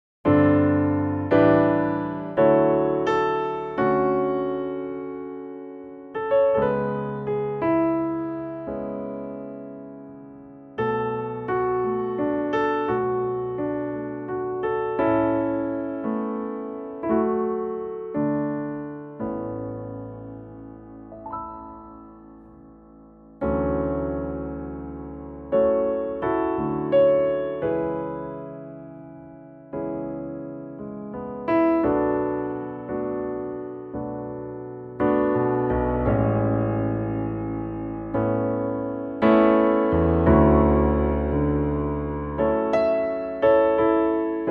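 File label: A Bb piano, male; key - A to Bb - vocal range - D to D; piano only arrangement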